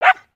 Pet Dog Small Happy; creatures pets; Lego

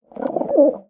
Monument Bird Coo; creatures organic

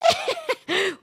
stink; emotes

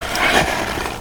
horse snort

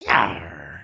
Walk, creatures organic

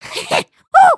slash ninja teleport; emotes

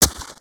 Jungle Banana Smashable; gnarled forest

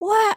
raincloud; emotes

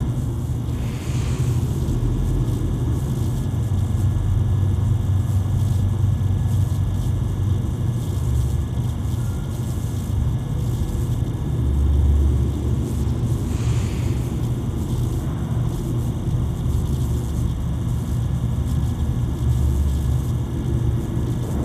Amb Dojo Lightn, ambience; Ninjago 2D

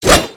Sword Slash; aronwk collection; ndaudio

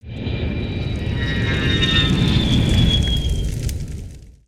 rocket land

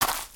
Footstep Leaves; creatures pets

